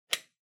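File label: RJ Wall Socket Telephone Plug In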